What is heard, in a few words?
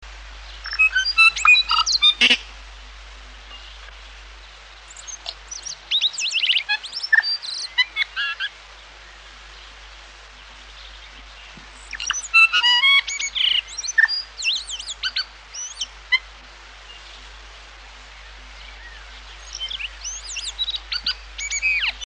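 Tui, Bird Calls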